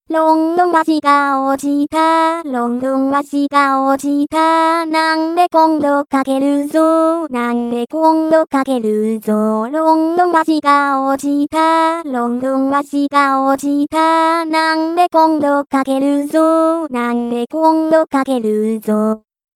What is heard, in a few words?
London Bridge, vocal only